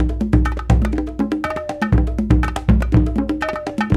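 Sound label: Busy Drum Whistle, African Contemporary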